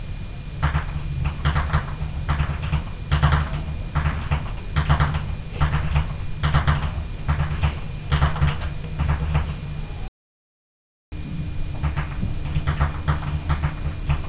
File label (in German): schnalzen; Wimmern; jugend